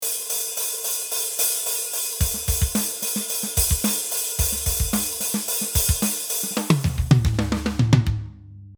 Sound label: HH Open